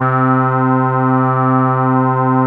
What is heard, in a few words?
pady, Synths; Notes